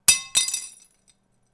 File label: Glass; FXHome